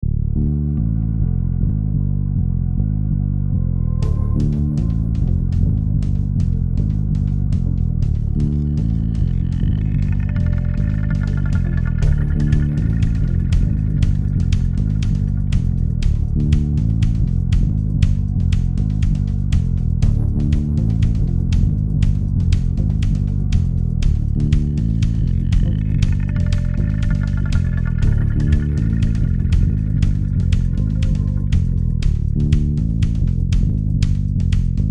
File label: Intro bas